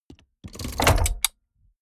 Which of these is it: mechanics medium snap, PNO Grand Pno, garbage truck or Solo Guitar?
mechanics medium snap